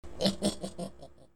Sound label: laugh